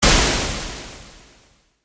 element water; maoudamashii

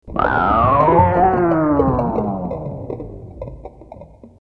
voice monster; maoudamashii

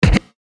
battle; maoudamashii

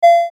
retro; maoudamashii

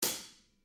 instruments drum hat; maoudamashii

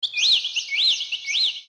voice bird; maoudamashii

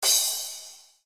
instruments drum cymbal; maoudamashii

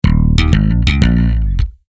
instruments bass; maoudamashii

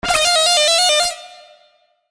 magical; maoudamashii

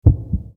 se heartbeat; maoudamashii

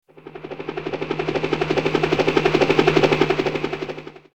vehicle; maoudamashii

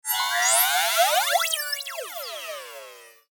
magical; maoudamashii

